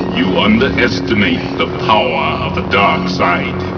power

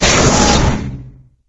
door open

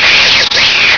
sqk deploy, squeek

